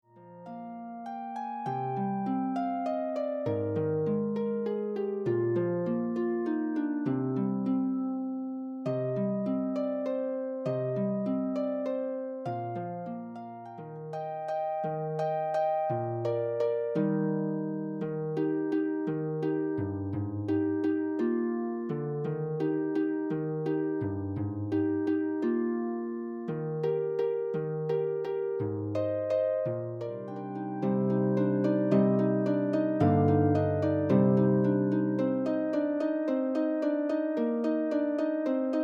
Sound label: songs for harp